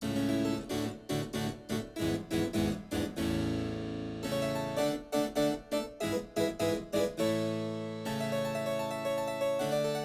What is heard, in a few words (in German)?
Cembalokonzert